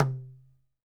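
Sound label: TABLA right; WorldSounds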